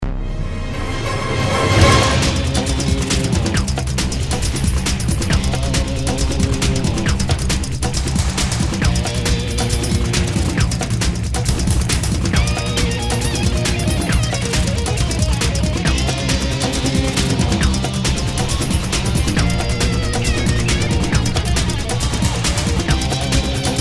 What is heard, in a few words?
congas